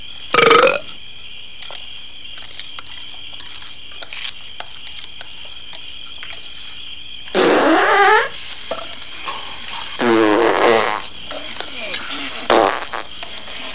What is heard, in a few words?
beanfart